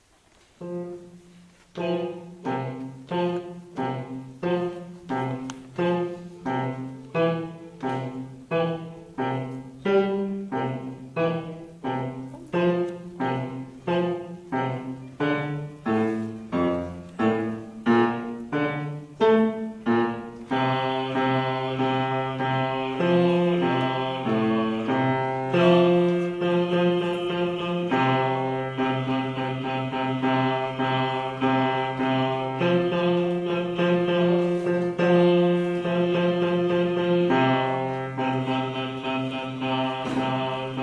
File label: Basses; Tancuj